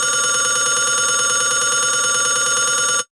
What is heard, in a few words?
Telephone; Roland BK-5